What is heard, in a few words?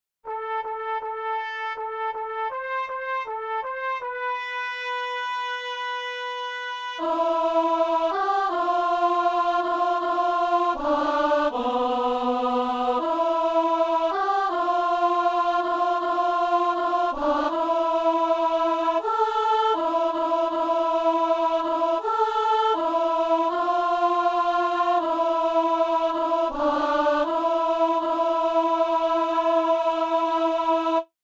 alto; Messe de Saint-Paul - Anamnèse; Version française